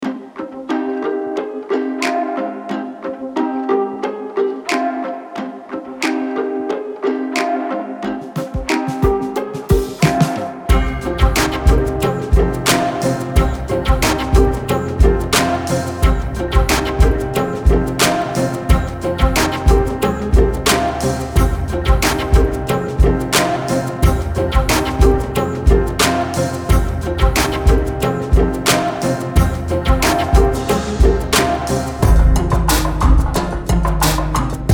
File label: Hip Hop For Training